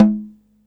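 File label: snare drum, Drums Acoustic; USB Soundscan vol.10; AKAI; 90s sample CD; DYNAKIT 2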